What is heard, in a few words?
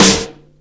verby snare d sharp key; verby snare sample; snare drum samples; one shots